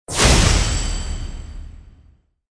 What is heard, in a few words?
quest start